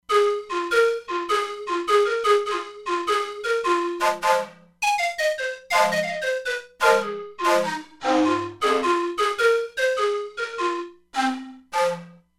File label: Stereo Siku, E-mu Proteus-3; Factory patches